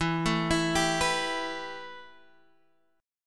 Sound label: A 7th Suspended 2nd E